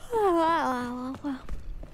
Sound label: Yawn